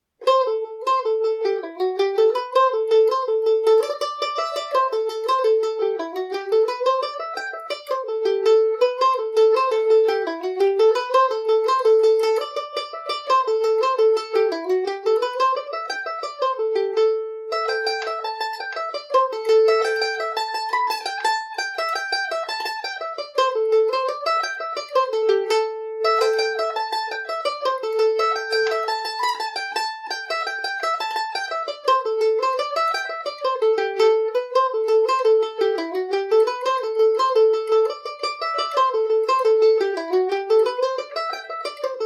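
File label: jig, A dorian, irish mandolin; Old John’s; Kentucky KM1000 mandolin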